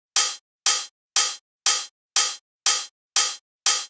Hi-Hat A-m 120 BPM

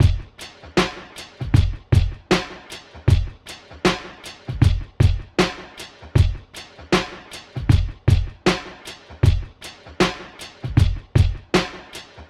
Drum Break 78 BPM

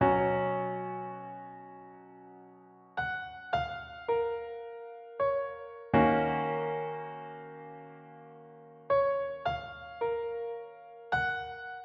162bpm keys; Yung Boi Drip Vol 6

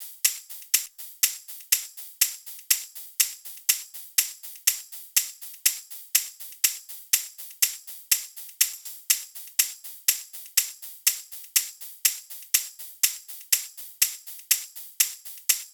HAT LOOP 122BPM; BOEHMER

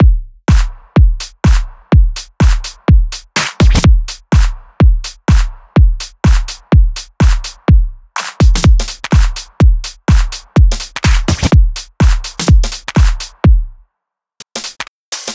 Drum 125

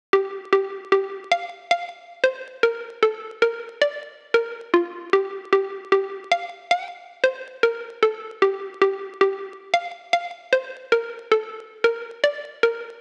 114 G Sunset Pluck

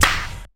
CLAP

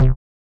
C Synth Bass

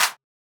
Clap

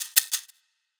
Drums Shaker One Shot

